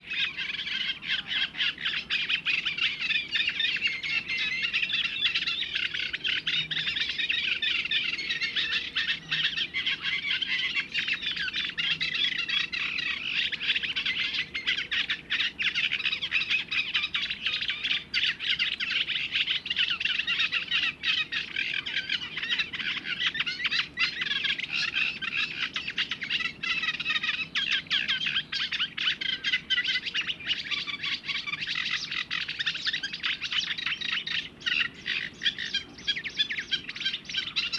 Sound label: with both bitterns